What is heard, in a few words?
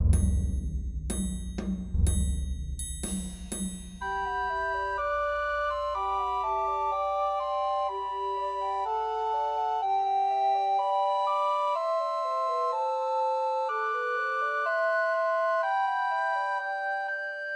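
Trios for Two Descant and One Treble Recorder; Joy to the World; Christmas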